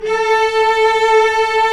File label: Vcs2 f vb; STR Vcs 1-2 vb